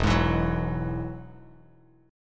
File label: E Major Add 9th, E, Bass Standard; strummed; JGuitar